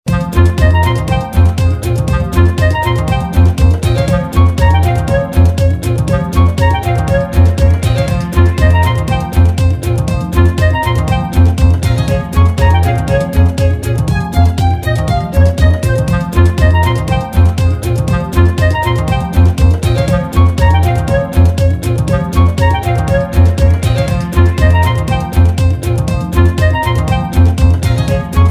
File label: clarinet